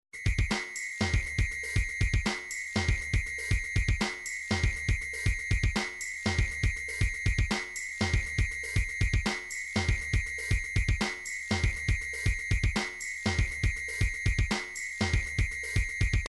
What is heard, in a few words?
midi drum patterns drums